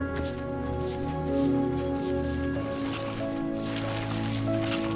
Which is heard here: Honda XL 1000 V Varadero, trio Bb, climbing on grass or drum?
climbing on grass